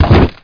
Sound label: bod strk, bugs; Starship Troopers